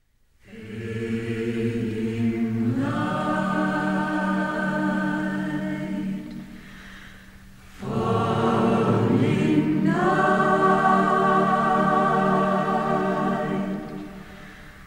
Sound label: Taps Vocal; used in Tribute video